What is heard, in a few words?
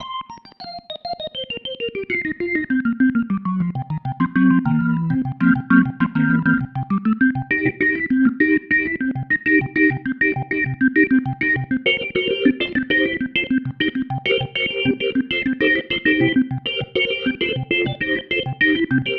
Pattern, Organ